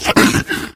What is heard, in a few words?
tushkano threaten